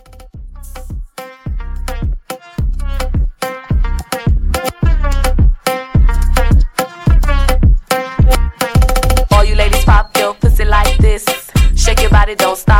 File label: Moomba Hype Edit, 107